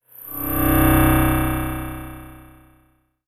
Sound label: Transition Delay Medium; Adobe; Production Element